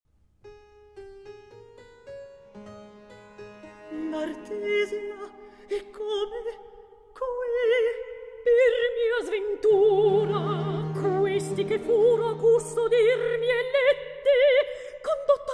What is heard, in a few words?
Recitativo; Alceste, Martesia